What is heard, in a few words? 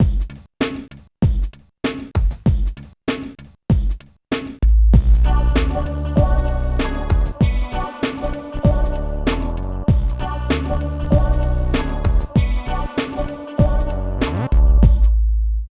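LOOP, left; East West - Phat & Phunky CD2; 90's Sample CDs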